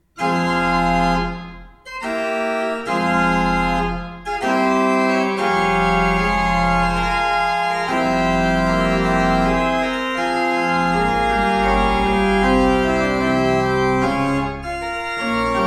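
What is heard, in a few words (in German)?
Schuke Berlin, pfeifenorgeln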